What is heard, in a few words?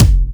BD, Dance Mega Drums, BD HOU; Best Service